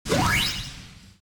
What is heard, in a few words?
Powerup